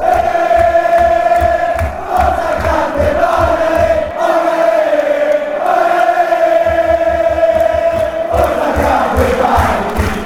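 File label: come on great bari, football chants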